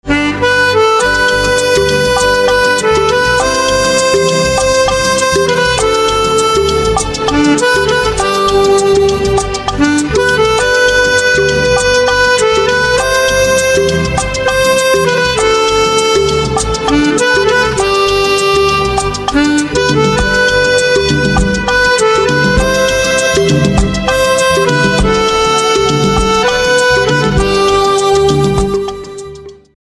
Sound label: Harmonica